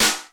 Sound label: EMU SD, DRUMS; Crypton - DrumBase5000; CD DRUMS #1